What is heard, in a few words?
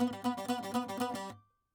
saz